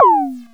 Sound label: TOM; KillerTweaksII; DirectWave